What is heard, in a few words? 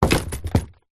shoes leather business womans throw down drop wood floor; foley